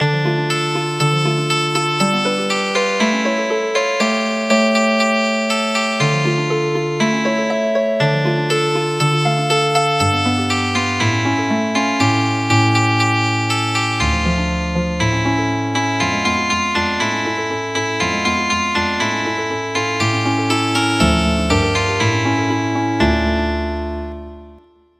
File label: Healin Harp